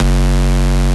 Bass Shot F#

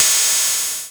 Ride, Dubstep One-Shot Drums

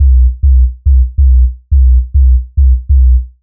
140 B BASSLINE, Dubstep

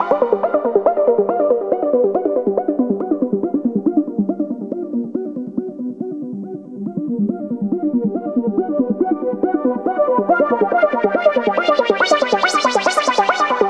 Bpm140 Early Synth FX Loop Gmaj Gm; Dubstep; FX Loops

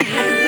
D STAB, Funky Underground; Loopmasters; Funky Underground Vol 2

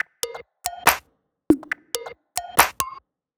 140 bpm Top Loop, Dubstep; Mint

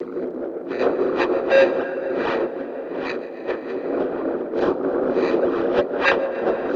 Fx Loop; Bpm142; Spying Glass; Dubstep

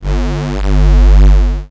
BOTTOM FREQUENCIES G1, BASS; Loopmasters; Jack Sparrow; Deep Dubstep Progressions

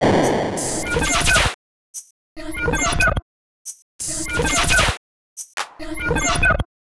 140 FX LOOPS; Dubstep; Loopmasters; The New Sound Of Dubstep

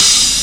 Hat, Dubstep One-Shot Drums

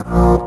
Bb STAB; Loopmasters; Funky Underground Vol 2

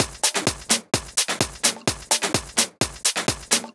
Loop, 128 BPM

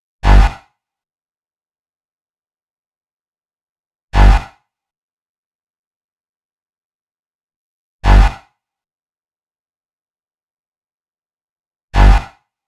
123 B Bass, Complex Electro Synths; Prime Loops; Impulse

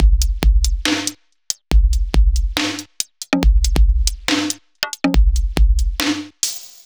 Bpm140 Pump Break, Dubstep, Drum Loops

